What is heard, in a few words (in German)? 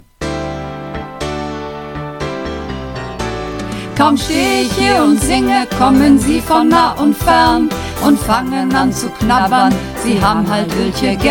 Werbemusik Mehrstimmig